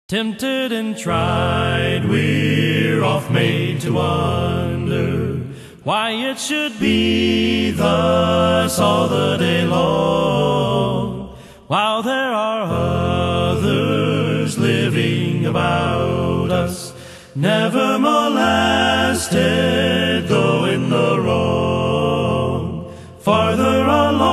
Quartet A Cappella

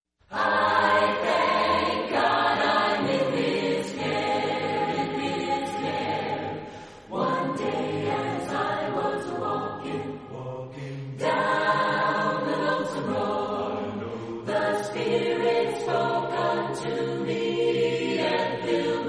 Chorale, Voices in Praise acappella

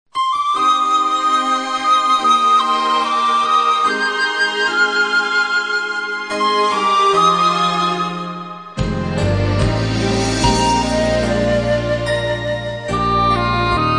Instrumental Praise

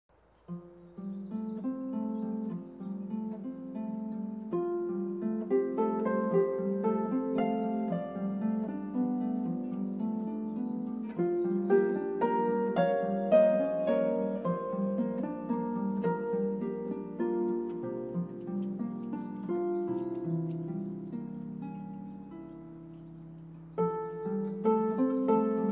Harp

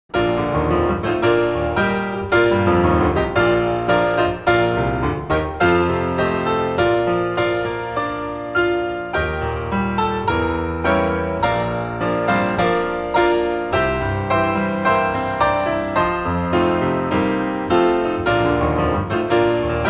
Keyboard

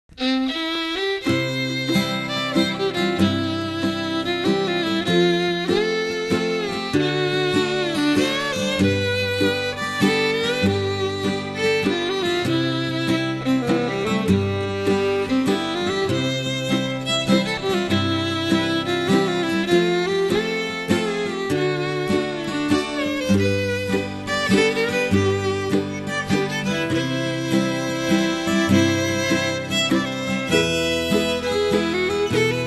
old time gospel instrumentals

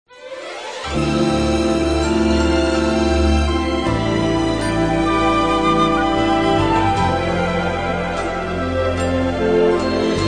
Orchestra Panpipes, Great Is Thy Faithfulness

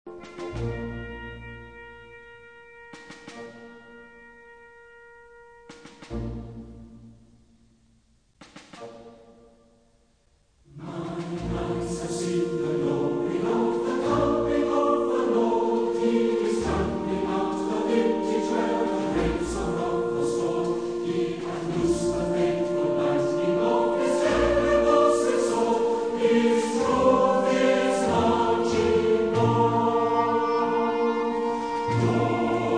Orchestra and Choir

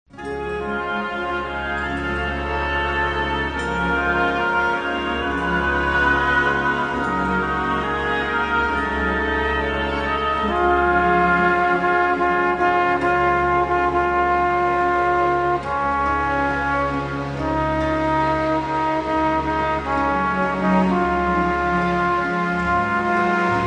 Orchestra, Strings; Majesty Orchestra

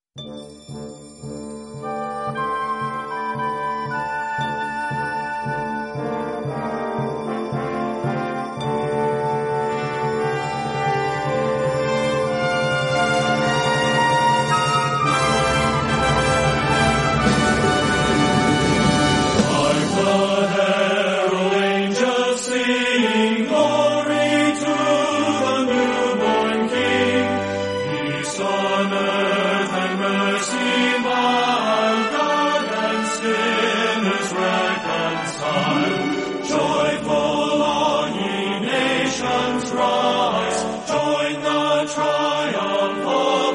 men's chorus

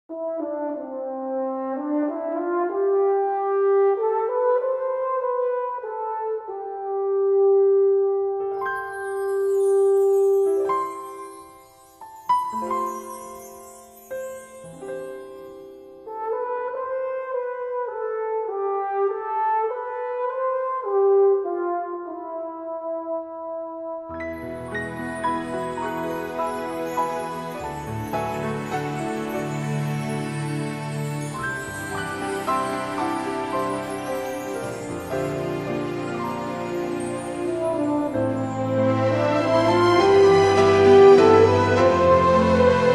Christmas Meditations for Orchestra

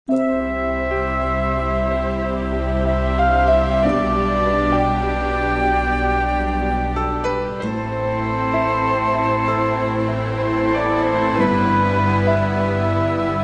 Orchestra

